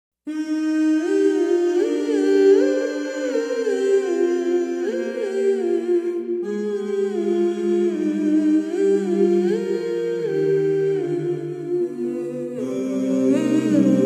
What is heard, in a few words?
vocaloid